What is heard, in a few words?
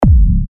TECHKICKS C#, techno kicks; Manhunt; revealed techno kicks vol 10